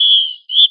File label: final whistle; TotalDemo